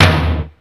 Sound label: Tom, Ensoniq ASR-X Pro